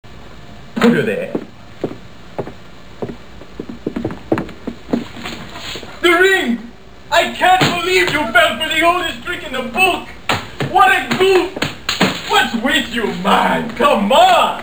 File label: ring; spaceballs